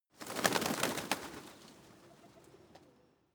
pigeons flock rise soft; natur-tiere; voegel

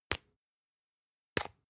spice jar smack open; industrie wirtschaft; gastronomie

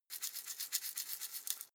herbs shake light; berufe; gastronomie; soundpool

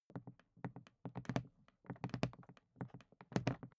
briefcase plastic handle handling soft; industrie wirtschaft; bueroarbeit

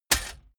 metal hit clank bright

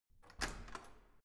corridor door open hard

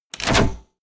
sci-fi mine place; schusswaffen

